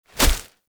Wolf Step; Animals; Duviri

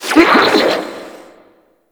Grineer Frogman Pain, Vocals

